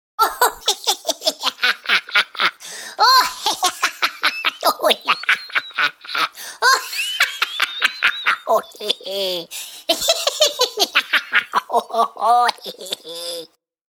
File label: Leprechaun Laugh, Cute cartoon style Leprechaun or other small character laughing.; Funny Sounds